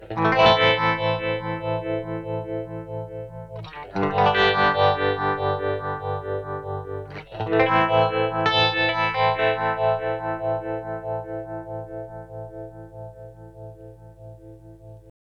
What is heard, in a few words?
Radius Tremolo; Red Panda